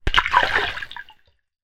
nature water splash small underwater object throw in; zapsplat